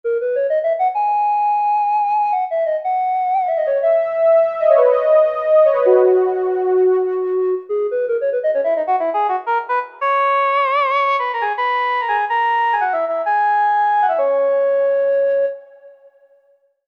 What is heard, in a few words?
JP8 Flute; V-Synth